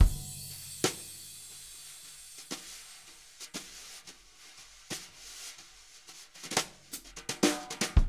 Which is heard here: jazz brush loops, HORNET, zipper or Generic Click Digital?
jazz brush loops